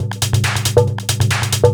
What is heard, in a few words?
drum, 138, drum-loops, tribal, Techno; Techno ID; tribal-tune